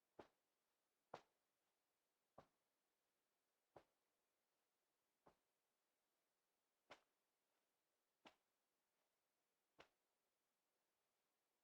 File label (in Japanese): 布団ぽんぽん; 動作系